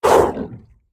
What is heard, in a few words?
worm death; factorio; Factorio 0.14.21; creatures